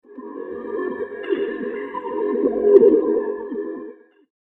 Deep Distant Tractor Beam; science fiction sound effect; Andrew Fleming

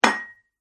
Medium Sized Dinner Plate Placed On Tabletop; Dishware Sound Effects; Household Sound Effect

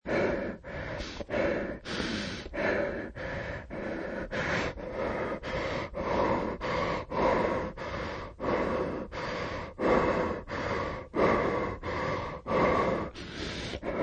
Man Panting, Breathing; Man heavy labored breathing Panting, Hypervenilating; Human; Loop

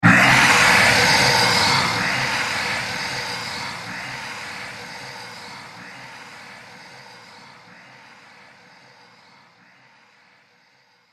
Future Dragon Warcry, monster sound effects; horror

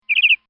Peacock; Animals

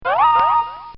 Cartoon Sound Effects Zip; Cartoon Sound Design

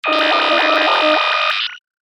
Sci-fi Quick Charge Up; star wars / trek style games

